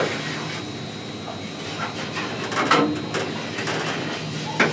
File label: turret; World of Tanks